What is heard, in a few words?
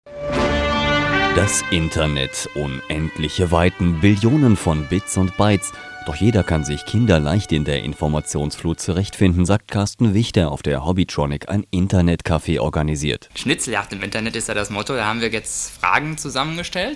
profunk